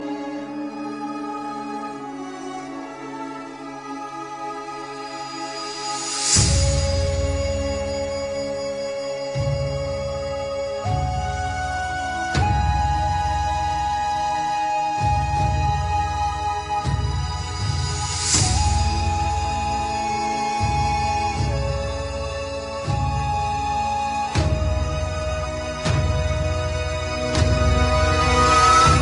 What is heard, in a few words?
Trailer Music; Korra